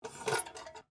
Lantern Pick Up; Foley; Pick Ups (Sound Effects:Objects)

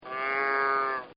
Cow Moo; Animals; Partners In Rhyme

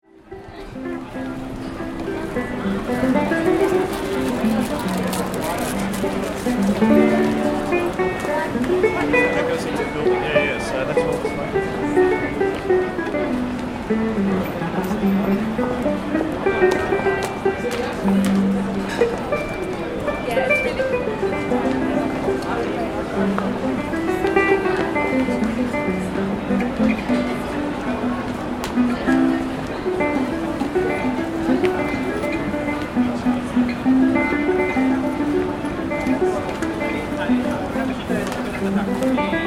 Ambience Melbourne Busker Banjo; Melbourne Buskers; Concerts

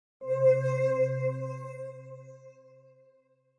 Suspens, Suspenseful eerie high-pitched sounds.; Scary Tones (Sound Effects:Horror)

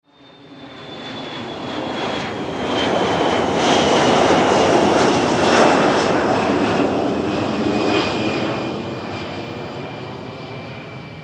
Vehicle Jet Kc135 Pass By Military; Airplane Sound Effects; Airplanes (Sound Effects:Vehicles)

